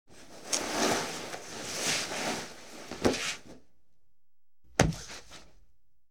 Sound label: Dragging the corpse on the ground